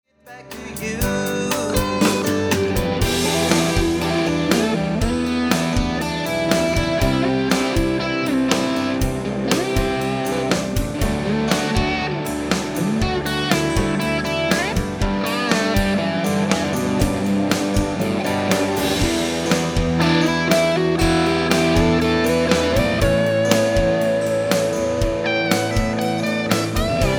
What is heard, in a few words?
Solo